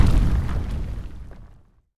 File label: Destroy Boulder